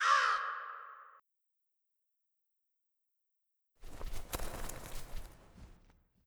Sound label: Crow